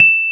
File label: E 0, Electric Pianos; Wizoo Electric Pianos